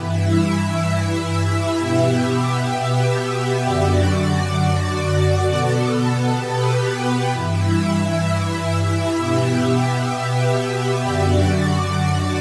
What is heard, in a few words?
pad progression; ultimate elec 2